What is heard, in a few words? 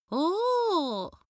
surprised grandma; vocals; comedy-cartoon; soundpool